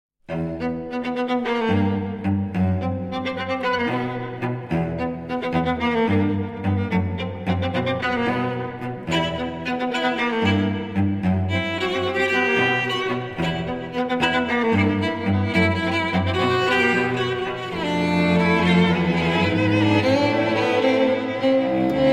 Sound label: tango string quartet excerpt